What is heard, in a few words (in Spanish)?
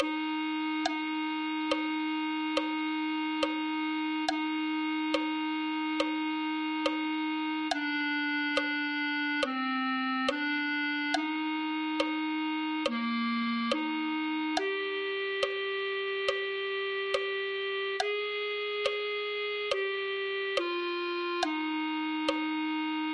clarinet1